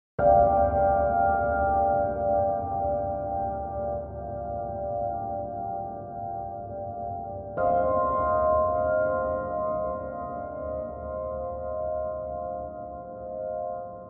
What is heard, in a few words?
orchestral arrangement; Majora's Mask